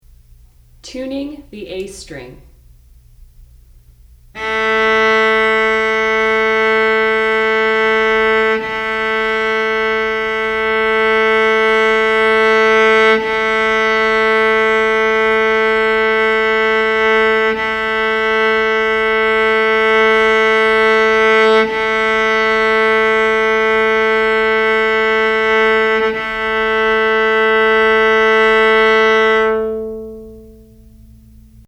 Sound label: Tuning A String, cello; Tuning the A String